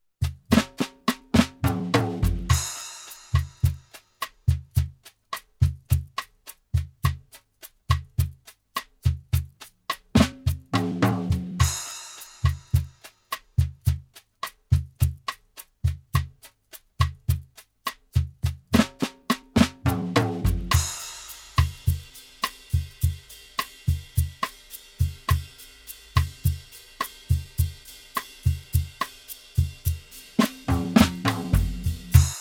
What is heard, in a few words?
Bossa; Korg KR-55 Pro